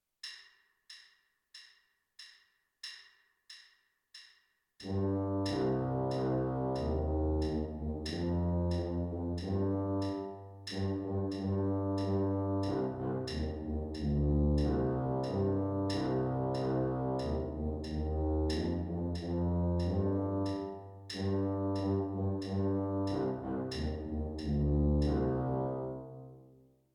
tuba

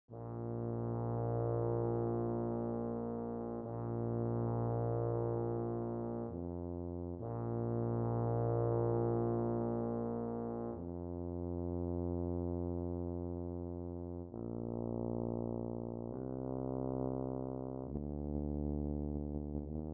tuba

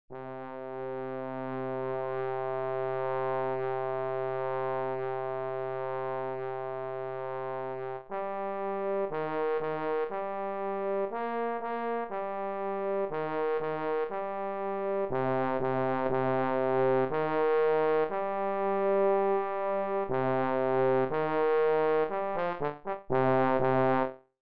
trombone baritone fast; celtic air and dance

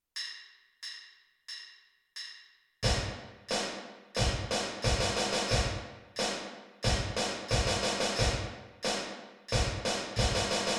major scale snare drum bass drum

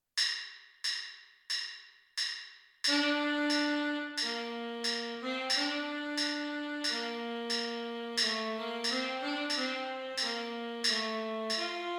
tenor sax part b; the conquering hero

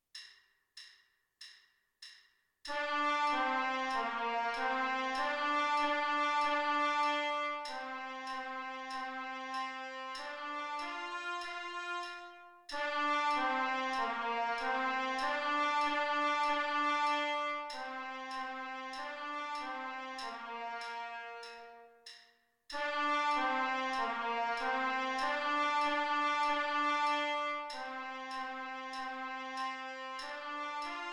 trumpet

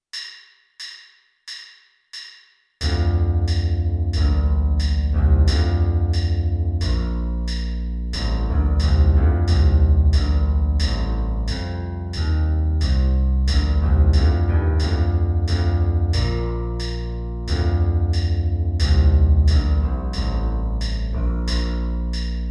electric bass parts a b; the conquering hero

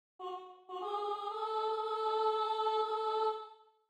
soprano

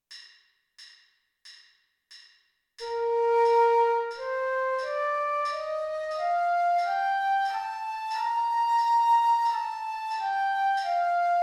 b flat major scale flute

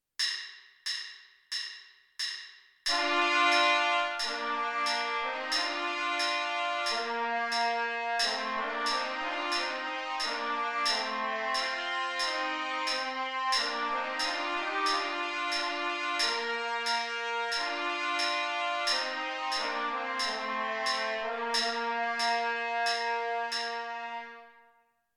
trumpet parts a b

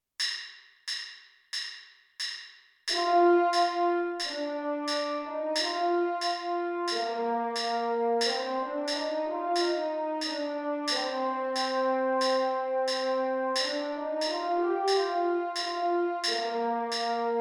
french horn

